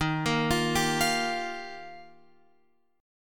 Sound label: Eb Major Add 9th, Guitar Open F; Ebadd9 arpeggiated